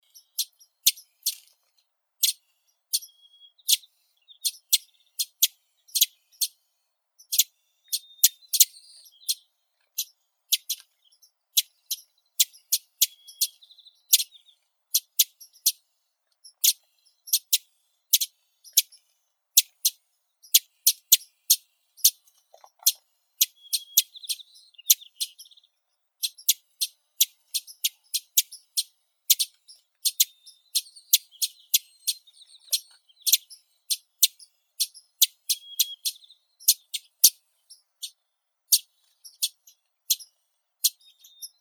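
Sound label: Wilson's warbler, Wilsonia pusilla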